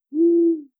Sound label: owl hoot; animals